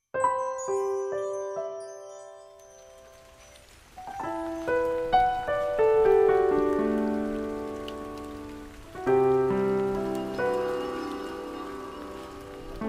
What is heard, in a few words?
Rain in Jiang Nan Erhu Cover